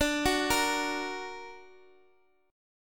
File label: D Augmented D down Guitar Half Step Down